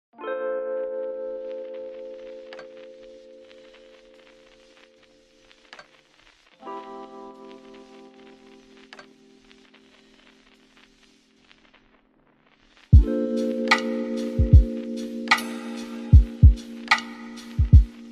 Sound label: Lofi